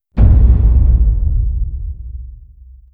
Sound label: planet killer fire; apocalypse